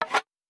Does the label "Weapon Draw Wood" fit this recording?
Yes